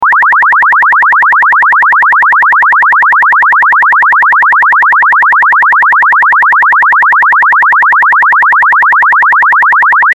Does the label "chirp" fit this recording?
Yes